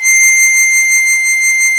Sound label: HARMONICA